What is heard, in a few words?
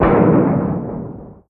Dropped One, hits and bits; Zero-G; Total Drum Bass